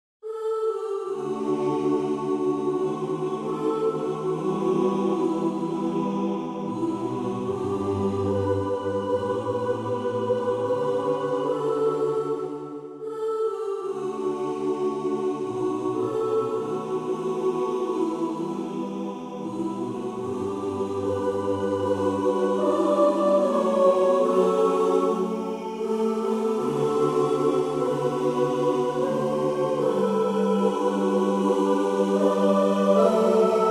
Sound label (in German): Vater unser acappella